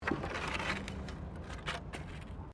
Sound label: Wood Move